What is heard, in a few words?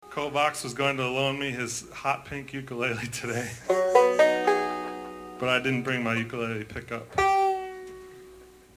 Ukelele